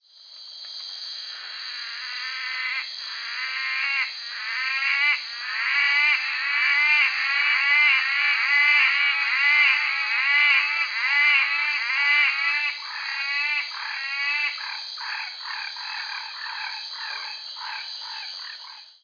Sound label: Litoria xanthomera, Mountain Pass